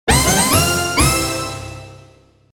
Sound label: orch hit